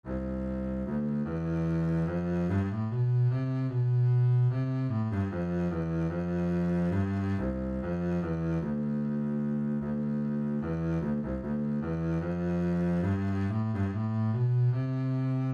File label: minuet bass